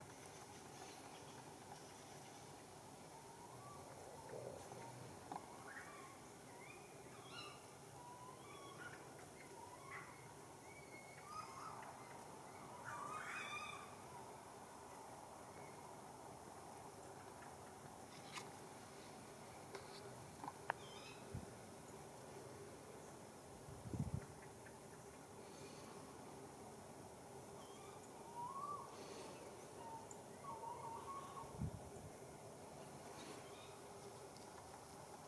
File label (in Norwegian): ukjentfugl